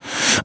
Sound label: vce inhale